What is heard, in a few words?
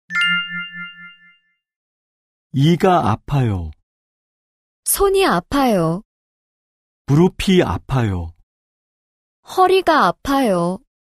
K-POP